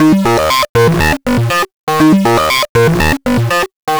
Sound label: Synth